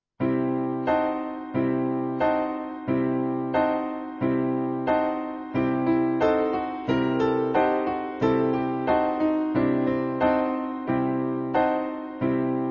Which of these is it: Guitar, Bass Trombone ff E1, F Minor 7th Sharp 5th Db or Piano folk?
Piano folk